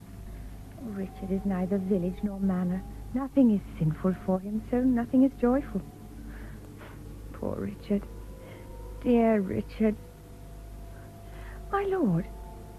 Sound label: Chilling background with final swell